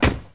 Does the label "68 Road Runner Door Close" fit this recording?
Yes